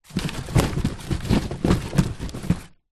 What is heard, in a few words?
cardboard boxes pile movement; foley; zapsplat